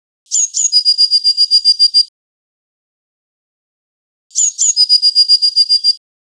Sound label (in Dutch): pimpelmeeszang met triller; Mezen; Mezenprojecten in Fort 7